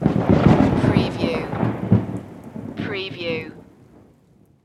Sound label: thunder